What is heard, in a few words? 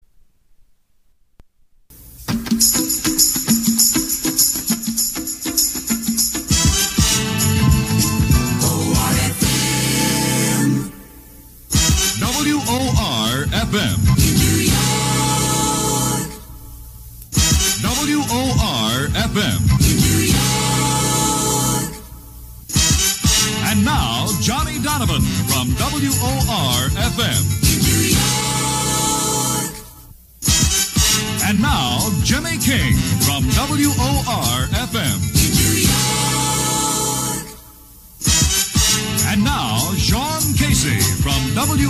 WOR FM Jingles Motown package; Drake Double A Image Pack